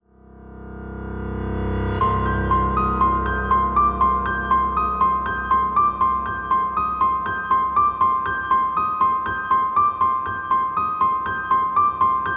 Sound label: tension; gerilim; By Burak Sound Bank; tension vol 18